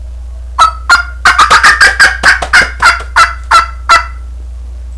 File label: cackle, turkey